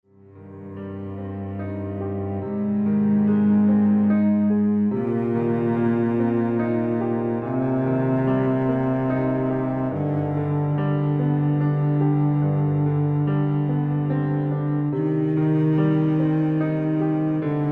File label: cello piano